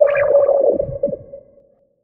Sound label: Watcher attack; Legacy